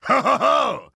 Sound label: laugh; Eggman